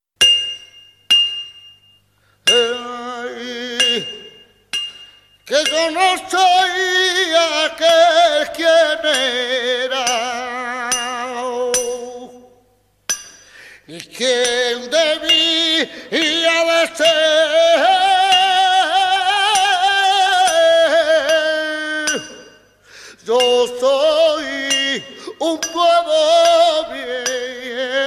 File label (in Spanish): Tona, flamenco